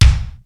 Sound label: SPD-8 KIT